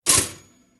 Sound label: sound Fly Trap; freedom fall